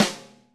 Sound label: snare, Roland MC-909